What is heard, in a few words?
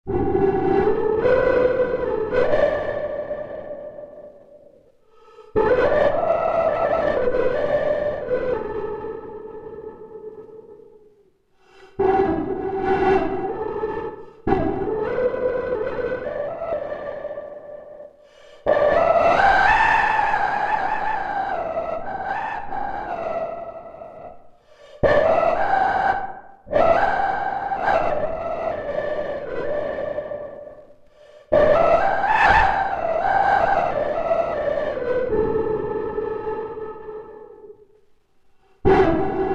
breath flute